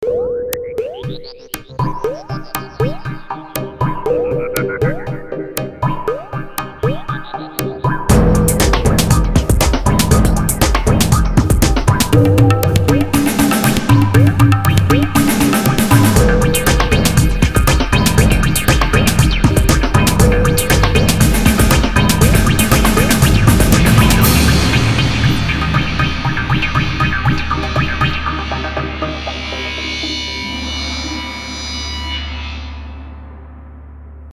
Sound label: Jingle